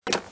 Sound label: Menu Place part; bad piggies; android; mobile; windows